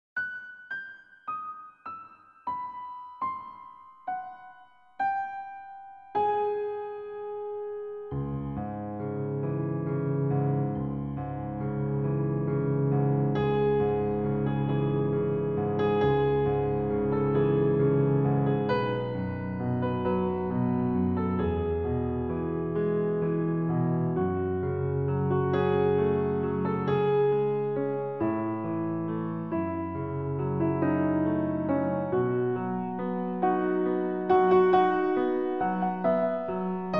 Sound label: piano canon